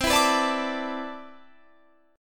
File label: C Major 9th Add 13th, guitar chord